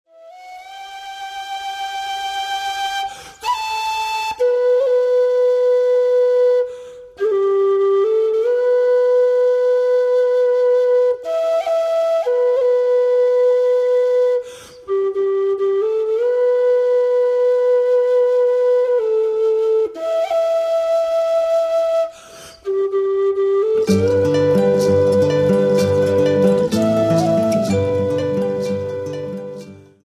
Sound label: Whistle; Peso Whistle